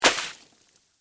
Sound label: Water Balloon Splash, Water, Balloon, Large, Splash, Impact, Wet; Balloon Sound Effects; BlastWave